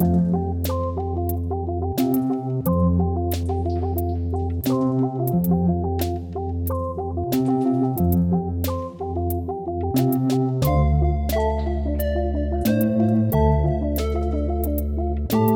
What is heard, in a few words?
Speedy Loop, 180bpm; Opseck; jenny leclue detectiv